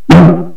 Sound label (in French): gros ressort; DoodleJump